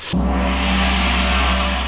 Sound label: Joy, happymusic; 特酷声音素材库; 背景音乐